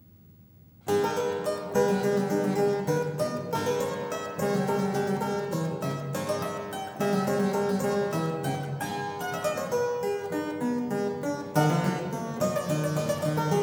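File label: Prelude 20 in A minor; BWV 865; Bach - WTC - Tilney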